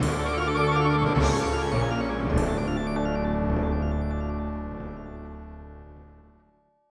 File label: Fanfare, Roland SC 55